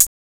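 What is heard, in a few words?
hi hat; E-Lab; X Static Goldmine 1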